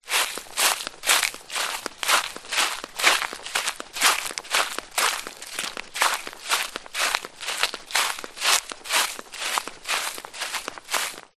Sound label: footsteps grass flip flops; foley